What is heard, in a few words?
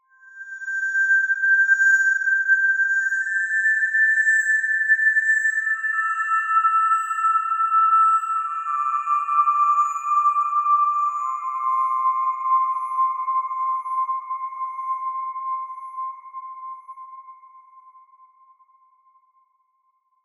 Glass fade, chillout; сэмпл синтезатора